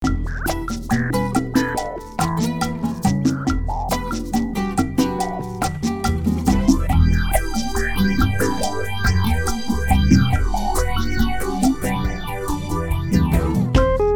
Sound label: Latin Shake; JoosTVD; For Your Pleasure Vol1